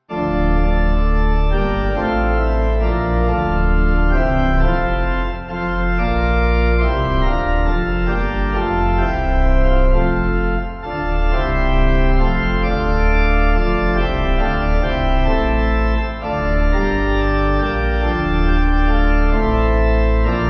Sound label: Pipe; Coutances